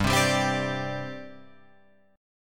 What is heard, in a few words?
G Suspended 4th G down Guitar Open F; strummed; JGuitar